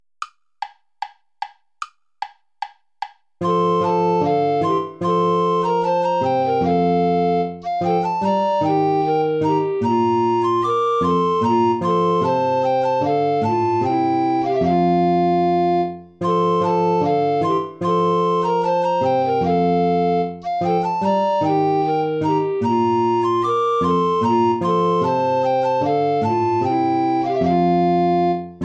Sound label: play along without alto recorder; praetorius michael ballet